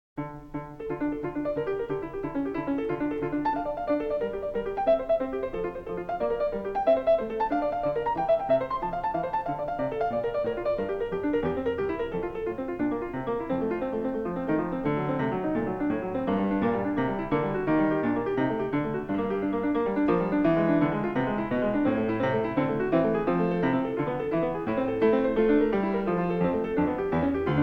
Prelude No. 6 in D minor, Bach, Well-Tempered Clavier; BWV851